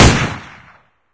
ump45, weapons; Source Engine; cstrike

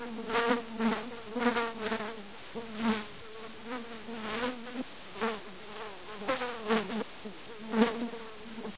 flies; source engine; hl1; video games

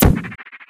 shoot blue, portalgun; video games; weapons; source engine

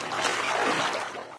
wade, player footsteps survivor walk

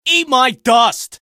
battlecry; vo; scout

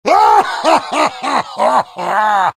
laughter big; vo; heavy

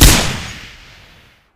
machinegun fire, machinegun m60; weapons; source engine; left4dead2

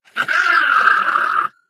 ballbot jump short

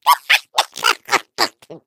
laugh, eggbot

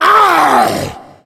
tank fire; Left 4 Dead; Source Engine

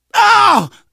hurt major, survivor voice, manager; source engine; left 4 dead 2

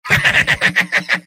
laugh happy; soldier; video games; source engine; tf; mvm

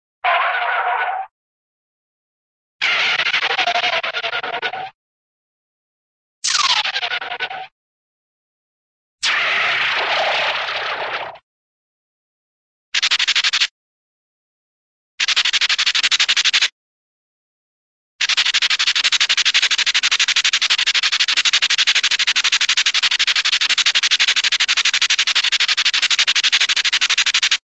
skid, cartoon short skid

